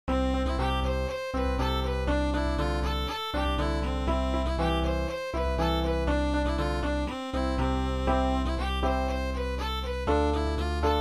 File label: polka irlandaise